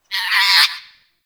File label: Forest Creature Squawky High, Ambience; Warframe; Grineer